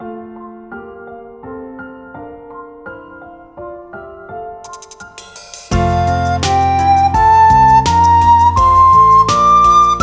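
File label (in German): Die Tonleiter F-Dur, Flötentöne; Meine ersten Floetentoene 2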